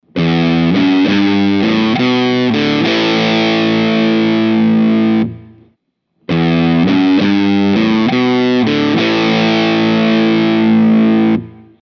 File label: TEST Big Muff